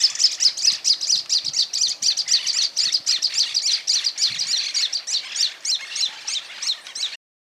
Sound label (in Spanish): espinero chico voz, aves